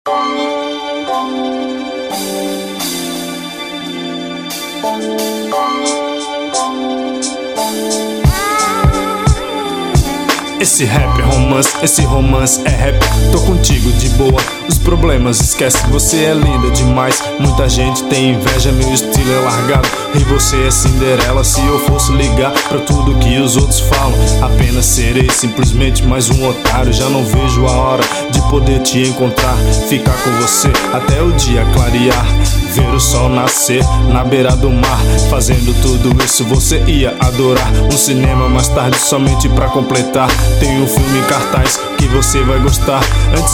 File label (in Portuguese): rap romance